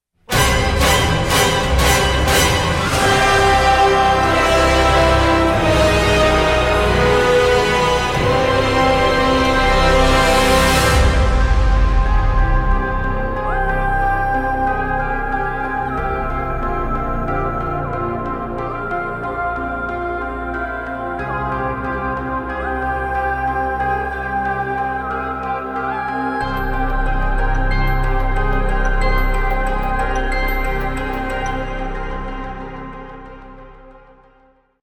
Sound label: End Stinger; Aberration; ARK Survival Evolved